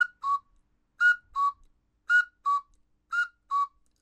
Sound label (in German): Kuckuckspfeife